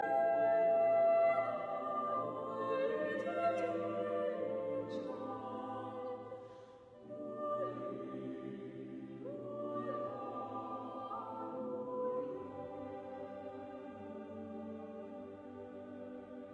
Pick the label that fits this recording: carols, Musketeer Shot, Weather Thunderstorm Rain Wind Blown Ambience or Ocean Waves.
carols